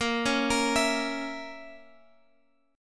Bb Diminished Bb Guitar Open G; 20,18,20,21,x,x

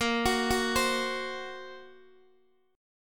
A sharp Suspended 2nd Sharp 5th, Guitar DADGAD

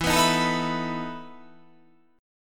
E Major 7th Suspended 4th

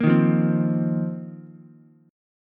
F 7th Suspended 4th F down Ukulele; strummed; JGuitar

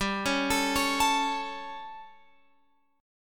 G Suspended 2nd Flat 5th G Guitar Open F; Gsus2b5 arpeggiated; JGuitar

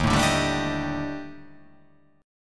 D sharp Major 7th Flat 5th D sharp, chord

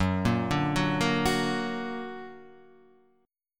Gb Augmented Guitar Half Step Down

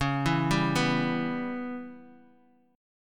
Db Minor 7th Flat 5th, Guitar, Open D

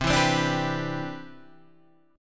C Major 9th, Guitar Drop D

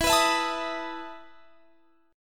E Major 7th Suspended 4th E down

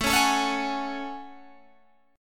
A Major 13th, down Guitar Standard; AM13 strummed

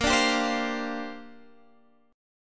A sharp 6th Add 9th, Guitar, Open G

